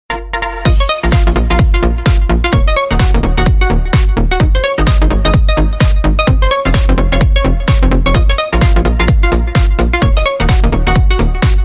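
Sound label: tancevalnij rington, dance ringtone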